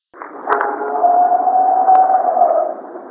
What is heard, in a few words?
summer shade howl